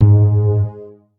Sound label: Big Bass G 3; Bassis